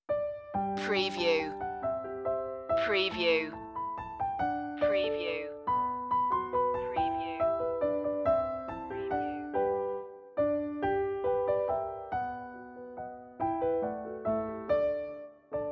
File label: wish you a merry christmas piano 140